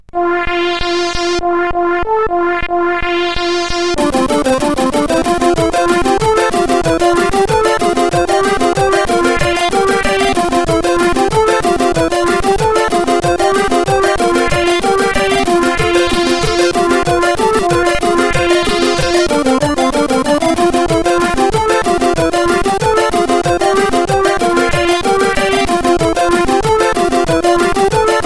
sid, CSG8580R5; Arthur

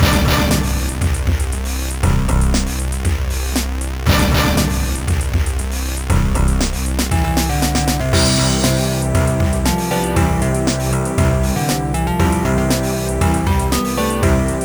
Dream guitar; MusicMaker V8

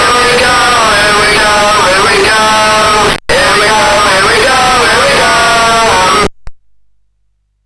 sid CSG8580R5; Five a Side

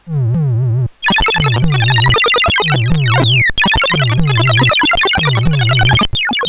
sid CSG8580R5; Paradroid

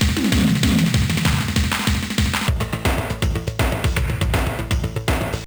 drum; Studio Magic

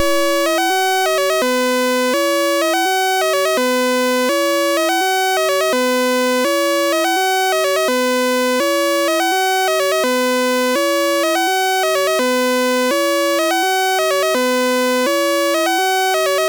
sid MOS6581R3; Pengon

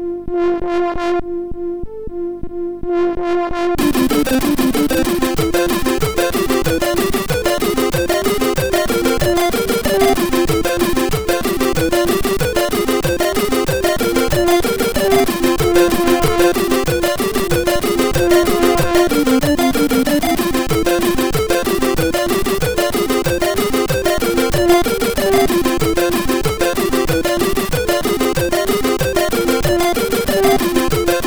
sid, MOS6581R4; Arthur

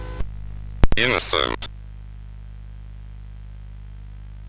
sid CSG8580R5; Cave of the Word Wizard Set 4

